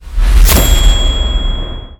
teleport; ff2; whitevolcono wizard

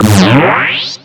beam charge; freak fortress 2; sans

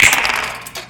dice; king dice

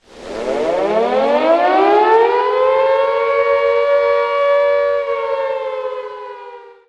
air raid siren; freak fortress 2; hitler immortal

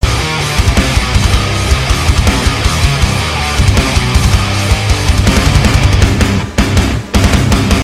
outtro music win; the myst scout

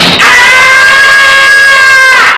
screem normal; ff2

